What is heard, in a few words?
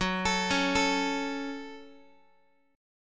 F sharp Minor F sharp, Guitar; arpeggiated; JGuitar